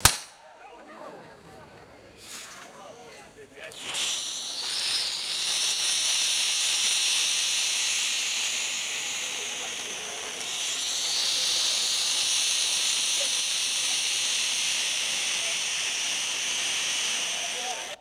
spinning catherine wheel firework hissing with chatter